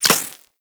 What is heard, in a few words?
Laser Impact Default; Warframe; Weapons; Bullet Impacts